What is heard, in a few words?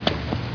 walk; Elevator